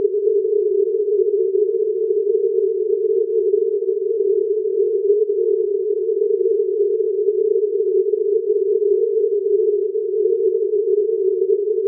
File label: inphase, test signals